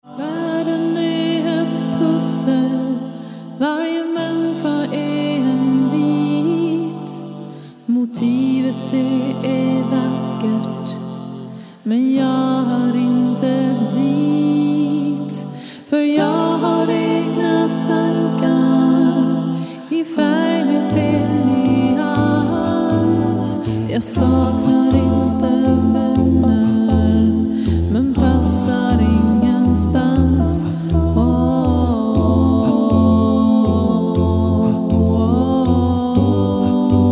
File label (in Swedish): musik